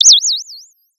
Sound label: Sound of a bird tweeting nicely